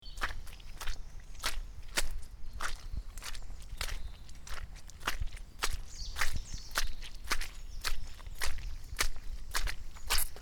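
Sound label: shagi po bolotu, footsteps in swamp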